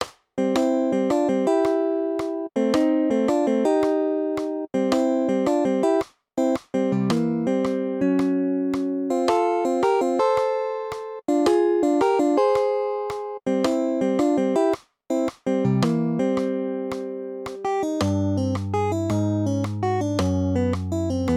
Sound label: duet turnaround, guitar; Triplet 8th; 4/4